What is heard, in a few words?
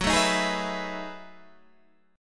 B 13th Gb, chord